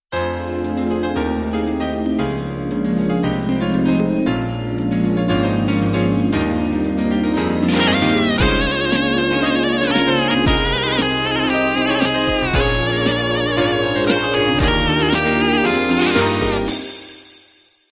8beat analog intro

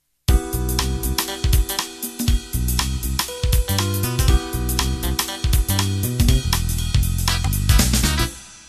Jazz Funk Intro

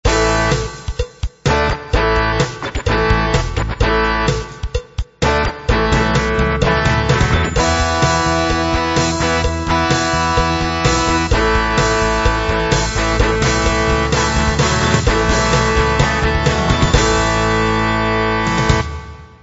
open rock

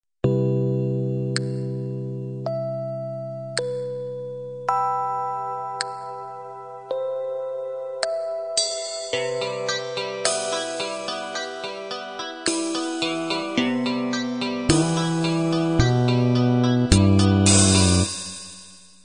halftime ballad intro

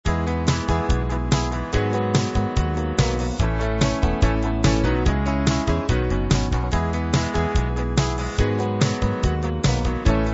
medium rock

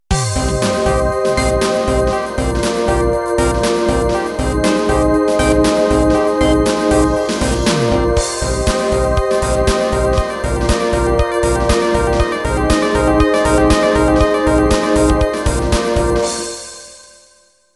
Dream intro

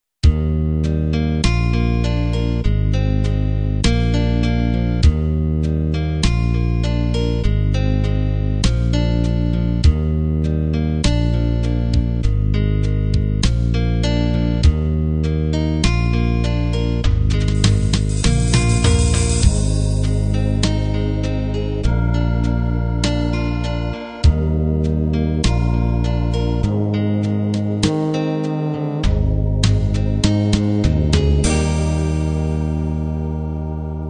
country-pop ballad

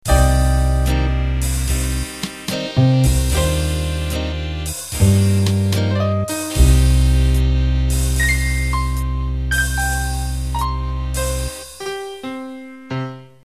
Big Band Ballad End

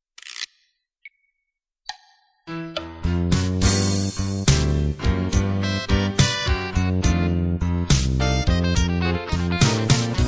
slow blues intro

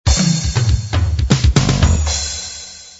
disco miami intro

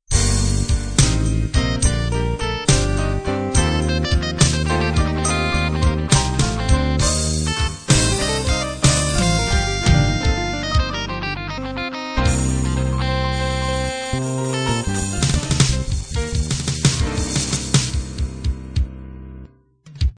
slow blues end

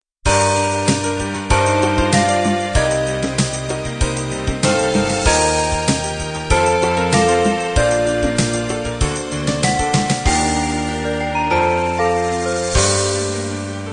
love ballad end

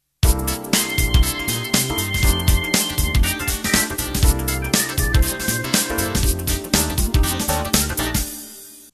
Rubber Funk Intro

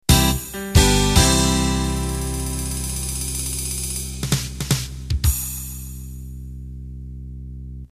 Unplugged Slow Rock End

